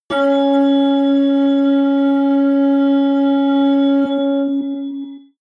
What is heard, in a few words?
metal organ high, hits/impacts